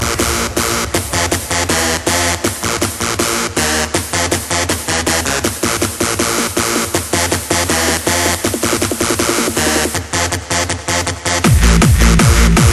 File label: Aaaaaaa